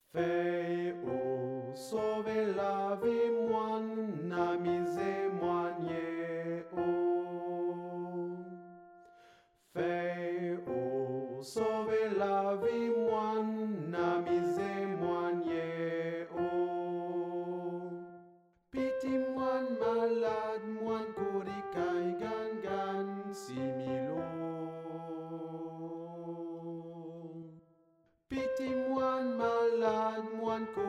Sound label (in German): SOPRAN